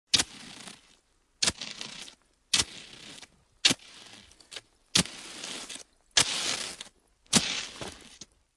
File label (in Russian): Palka po snegu